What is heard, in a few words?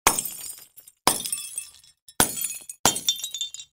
Light Bulb Breaking